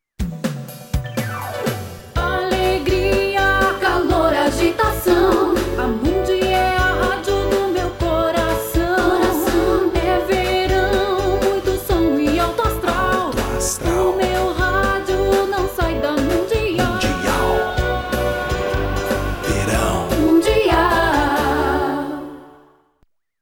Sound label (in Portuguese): Mundi FM Jingle Verao